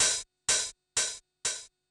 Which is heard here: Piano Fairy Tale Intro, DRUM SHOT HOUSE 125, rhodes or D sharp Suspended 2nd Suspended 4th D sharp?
DRUM SHOT HOUSE 125